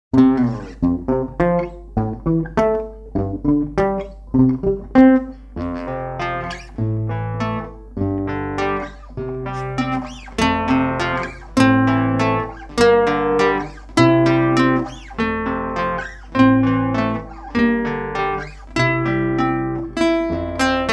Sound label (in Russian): etyud na bolshoe barre, guitar